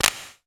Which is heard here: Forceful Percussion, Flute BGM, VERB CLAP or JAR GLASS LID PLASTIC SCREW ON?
VERB CLAP